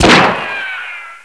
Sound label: pistol shot; wanted; weapons